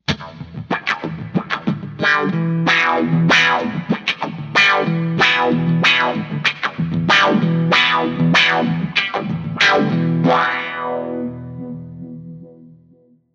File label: Mega wah; GFX KILOWAH